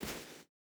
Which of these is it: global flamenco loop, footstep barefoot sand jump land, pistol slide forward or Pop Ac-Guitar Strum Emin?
footstep barefoot sand jump land